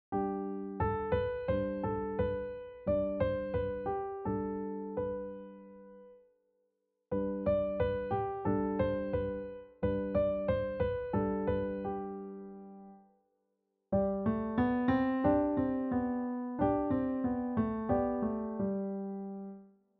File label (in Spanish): Dobles notas Sol